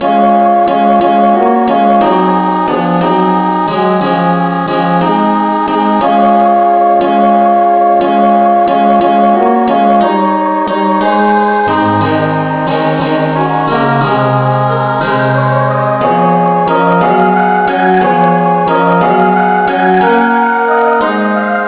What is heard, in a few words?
angel voices rev no vocal key cis